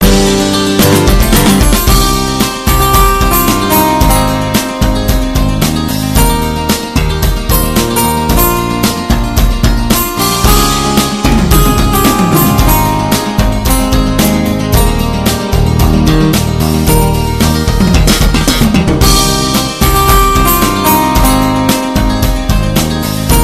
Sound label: Acoustic Curves